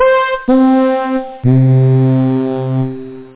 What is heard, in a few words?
French Horn